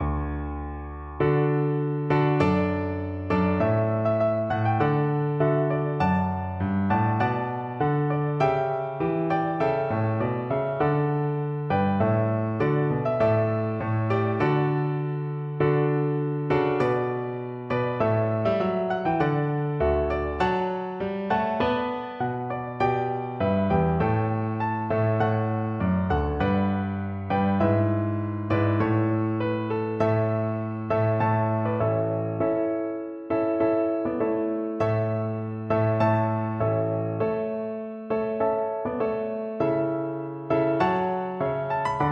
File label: Fireworks Overture piano